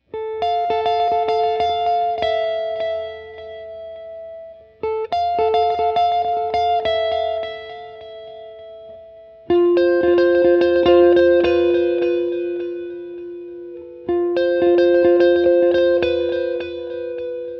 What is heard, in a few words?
Pedal